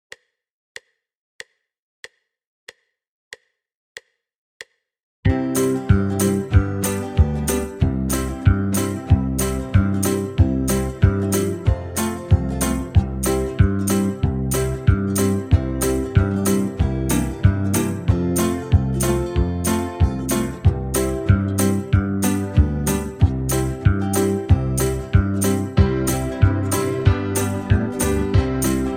c faster, backing track; banjo

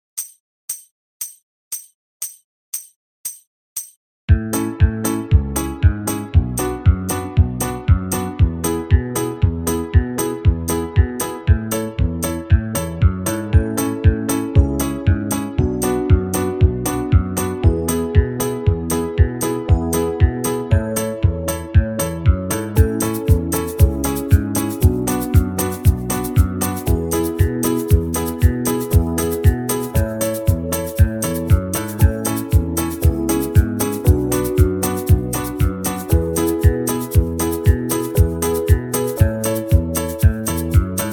key of a faster, backing track; banjo

